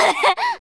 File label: Grunt; Lewd SFX